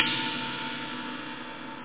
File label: tsing, cymbals